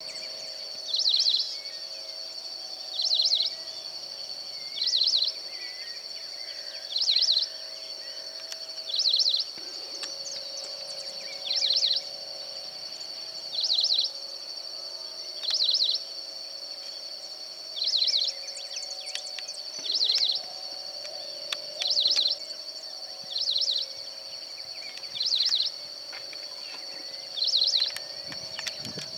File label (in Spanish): Pepitero Chico Voz, aves